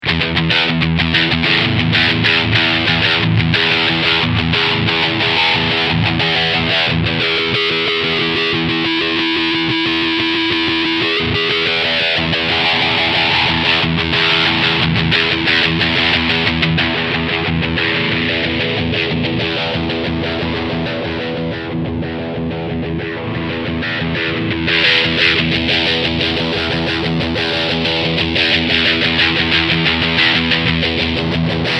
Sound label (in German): EQ Fahrt